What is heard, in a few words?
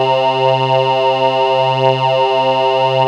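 VOX C2, CHOIR; TOMVOX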